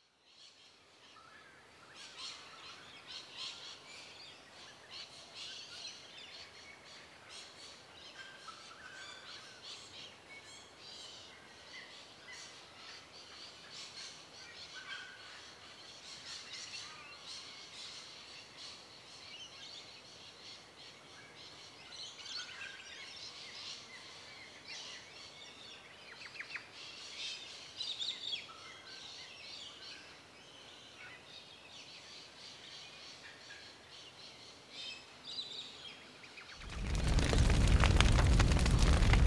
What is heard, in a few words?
Ambient; Microsoft Studios; Age of Empires III - Complete Collection; cinematics